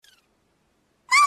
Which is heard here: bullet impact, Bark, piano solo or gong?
Bark